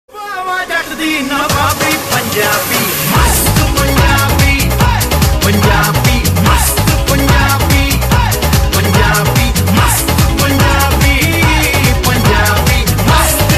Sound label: Punjabi Mast